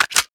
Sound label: ump45 clip in, weapons; Counter Strike Model Pack; CS2